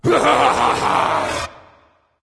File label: Laugh Fire